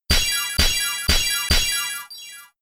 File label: Slap; Wake Up Slap